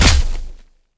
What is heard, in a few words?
imp sword wave